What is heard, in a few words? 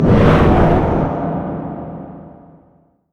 Lotus Orb cast